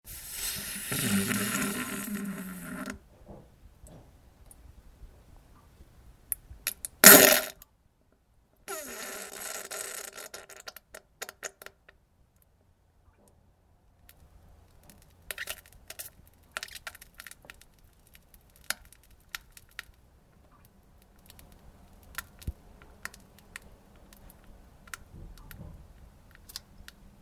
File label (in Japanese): げりおなら すーぶうすすー ぶりゅう音